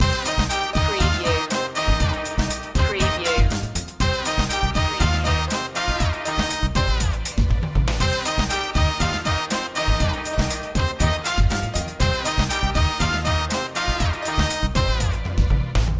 latin express loop

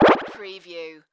Multimedia Alert

